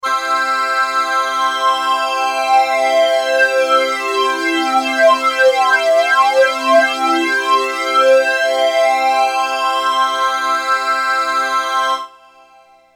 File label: lambda mxr fd